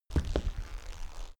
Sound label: step wood step